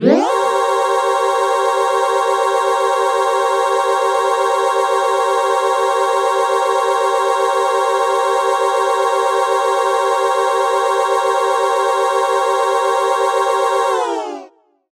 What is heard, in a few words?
Elevator; timmy turners grand sun 2023